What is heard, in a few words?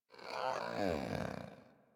Catbrow Pet Yawn; Warframe; Pet Ship